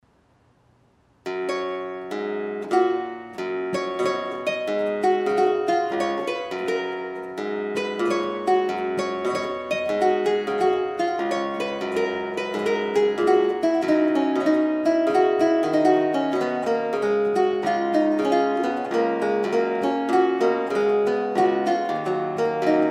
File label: prelude g, harpsichord; madsen